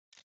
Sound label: button ui; kena bridge of spirits